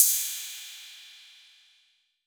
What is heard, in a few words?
PERC 808